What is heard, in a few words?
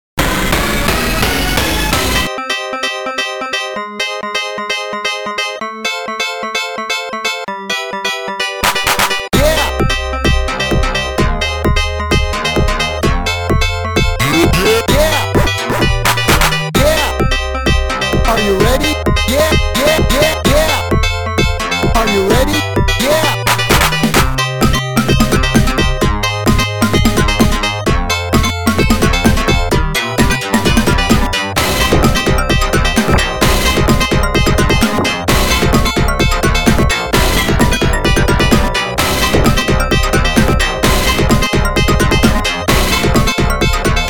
dance tune; Global Gladiators